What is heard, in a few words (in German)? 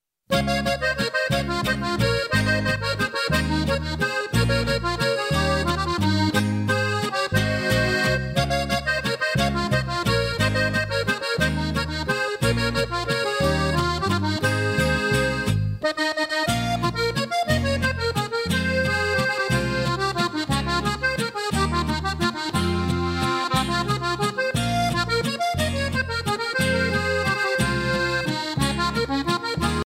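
Akkordeonspielereien, Walzer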